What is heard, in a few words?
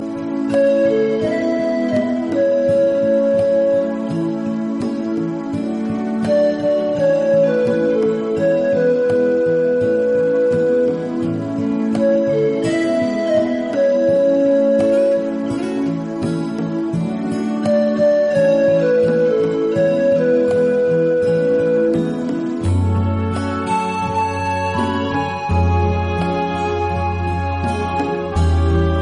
pan pipes